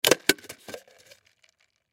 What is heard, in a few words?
Lid Remove Cup; Lids; Foley